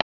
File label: double click; Dmate; K-12 Interface Study; Industry Competition